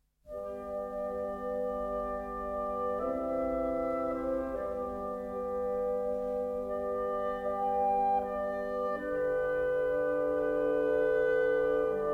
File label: Cello Concerto in B Minor, Adagio Ma Non Troppo; Dvorak